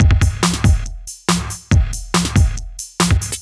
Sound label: electro beats 140